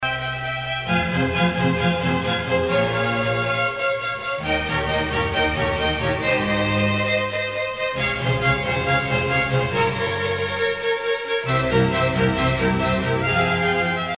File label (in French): hiver boucle marche harmo